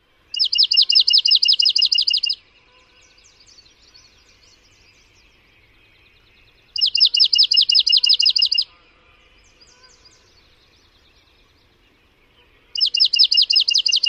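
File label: slow pulse-rate song, Swamp Sparrow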